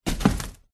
foley cardboard box set down on gritty hard surface; zapsplat